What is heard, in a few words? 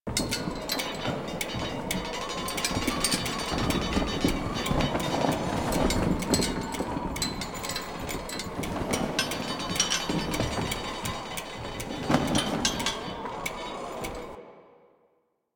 Floating Sailboat Loop; Ambience; Props; Warframe; Duviri